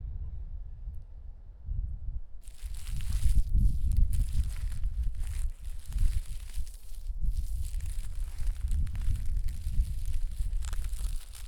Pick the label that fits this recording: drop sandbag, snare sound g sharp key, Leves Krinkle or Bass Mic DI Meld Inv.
Leves Krinkle